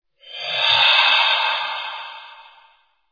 ghost

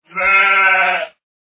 sheep attack voice middle

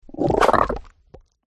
habu attack voice mid

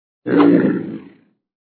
sharkman attack voice up

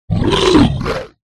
polar bear attack voice up

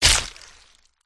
werewolf attack contact mid

